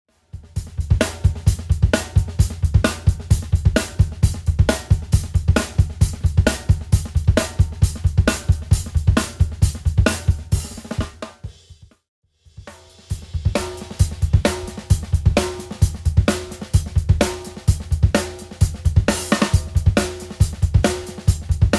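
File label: OCDP Bell Brass, snare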